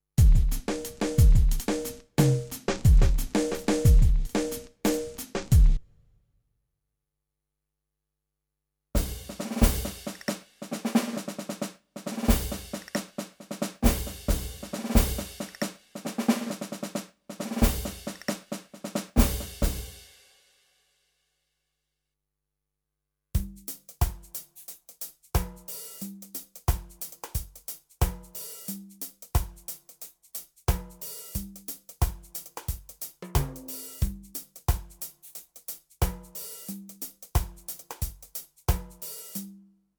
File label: Drumpattern